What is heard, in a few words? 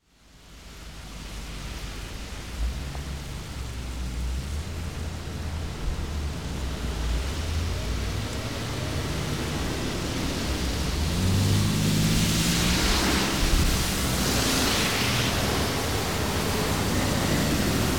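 cars, traffic jams and car traffic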